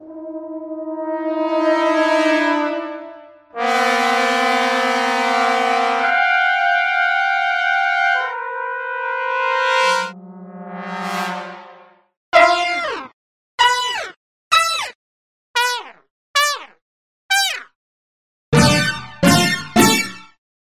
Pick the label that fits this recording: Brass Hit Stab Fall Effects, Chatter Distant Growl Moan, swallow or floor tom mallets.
Brass Hit Stab Fall Effects